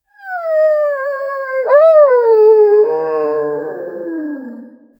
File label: fantasy deer voice